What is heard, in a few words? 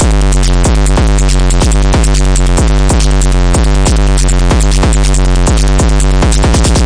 140 bpm monster hardcore offbeat, drum loops and breaks